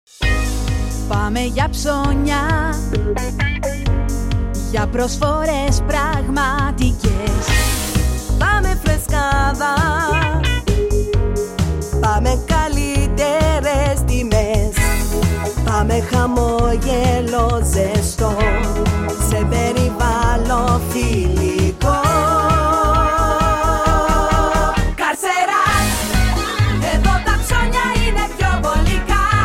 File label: Full Jingle; Karseras